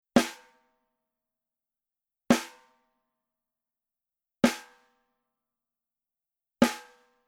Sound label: SNARE Etched Center Hard